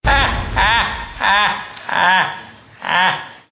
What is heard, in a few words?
laugh; the joker